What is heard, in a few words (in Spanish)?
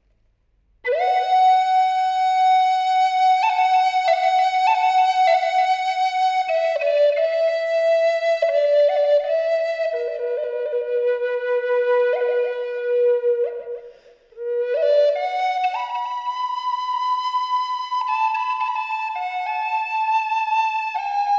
maple B, flauta